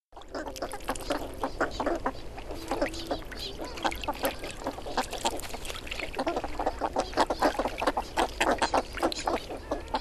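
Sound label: northern pintail, hyogo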